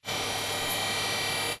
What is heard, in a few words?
arc panel small sustain; Titanfall 2